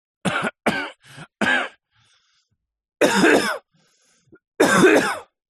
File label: human male man cough; zapsplat